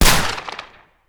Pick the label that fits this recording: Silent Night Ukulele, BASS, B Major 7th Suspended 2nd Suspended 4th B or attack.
attack